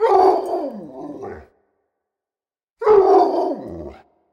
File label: wolf